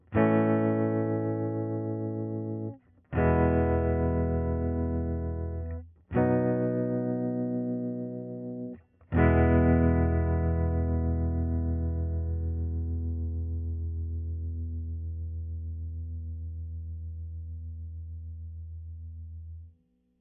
ELECGTR Chords 0cm at edge of cone; ATM25; audio technica atm25

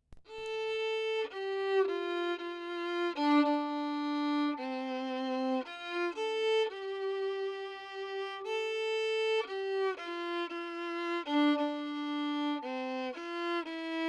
SEX1R Violin Lyric 24cm from F hole

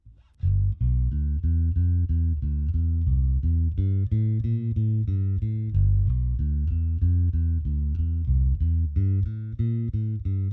Bass 1in from amp grill 3in from center of cone

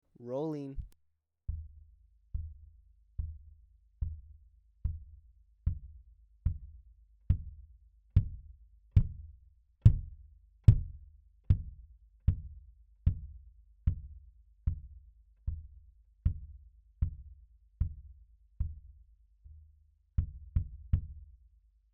Kick At the port; ATM25; audio technica atm25

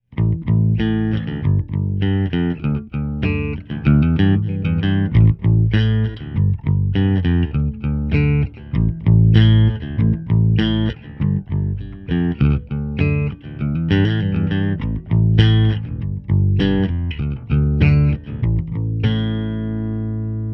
Bass Treated

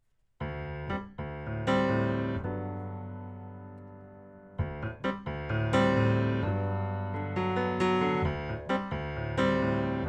Inside Piano by hammers

